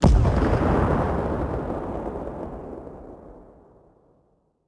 airflak; World of Tanks Blitz; ambient neptune

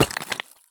landing tile; Escape from Tarkov

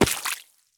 sprint puddle; Escape from Tarkov

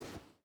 footstep rubber boots sand walk; Rust

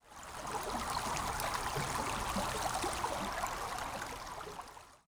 ambient battle; World Of Warships

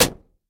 bullet hit outside; War Thunder

